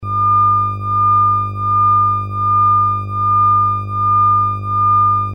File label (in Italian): battimento; Elettronica